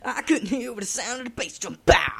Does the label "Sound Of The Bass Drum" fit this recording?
Yes